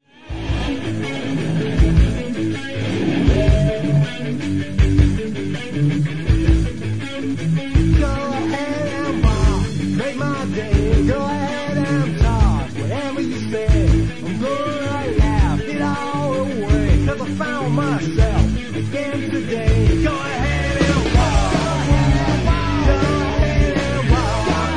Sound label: Rhyth